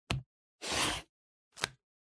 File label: Glue Stick Swipe